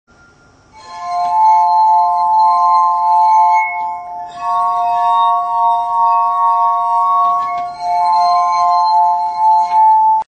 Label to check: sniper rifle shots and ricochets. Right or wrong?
Wrong